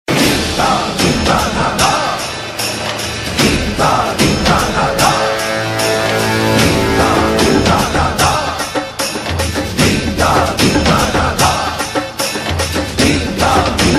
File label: BG Music; Ek Hasina Thi; Sakshi Goenka